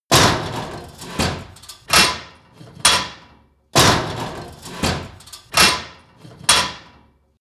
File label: industry machine mechanic metal complex